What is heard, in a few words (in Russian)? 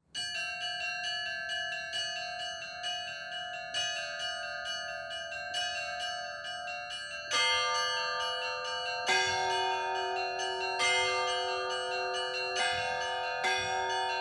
Колокольные звоны, Казанский Богородичный звон; Колокольные звоны Татарстанской митрополии; kolokolnye zvona tatarstana